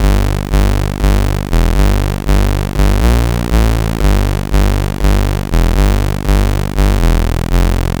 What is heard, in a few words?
C64 Bass, Commodore 64, Chiptune